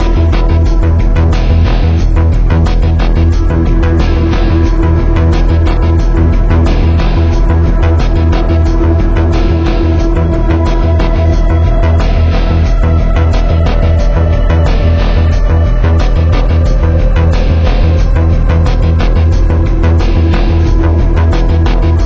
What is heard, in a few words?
Tension Loop; Barter Town